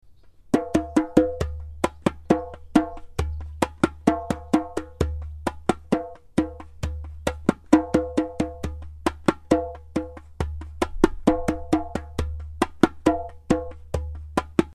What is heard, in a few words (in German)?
Djembe